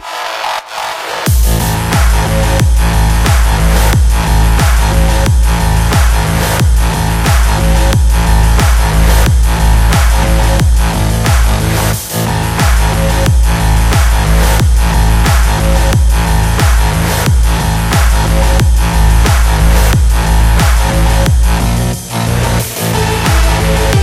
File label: Rave; Barotrauma; DynamicEuropa; Outpost